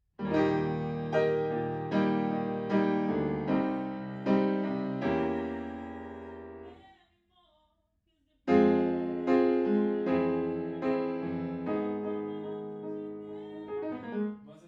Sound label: Piano Room